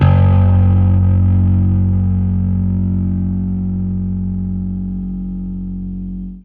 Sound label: Fuzz F sharp 2